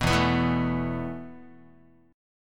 E Suspended 4th E, down, Guitar, 4A,4E,5A,5D,5G sharp,6C sharp; Esus4 strummed